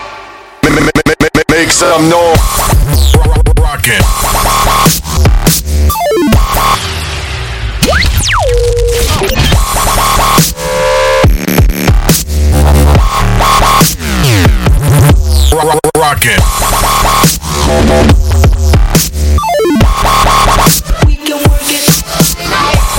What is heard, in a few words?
dubstep kickback